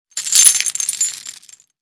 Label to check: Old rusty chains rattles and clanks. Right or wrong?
Right